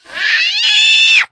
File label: spitter spot prey, voice